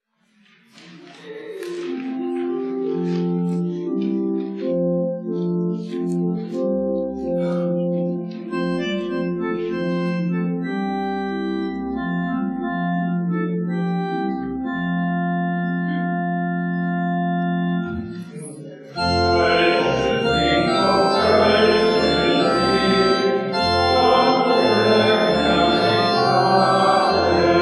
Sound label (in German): Leed met Urjel Koelsche singt op Kölsch